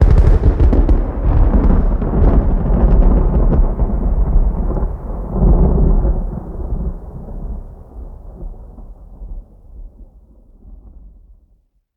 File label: Volcano Strike; moul